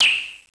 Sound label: chirt